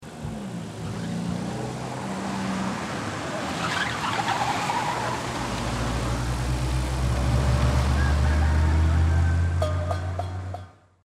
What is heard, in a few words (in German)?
kreisel ambient mit reifen